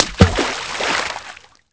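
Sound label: splash large; envrn; The Elder Scrolls III Morrowind GOTY; XBOX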